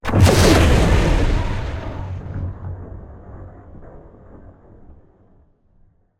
Planet Explosion High; MatiasMacSD; Eradication

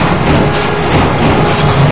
engine; hl2; borealis

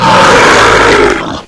bullsquid attack growl; hl2

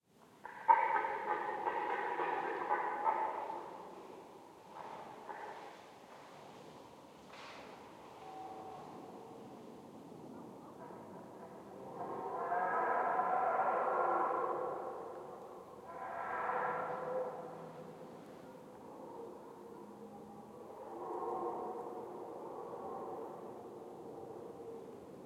animals stags roar short multiple times stereo XY; SoundHolder; Roaring Stags

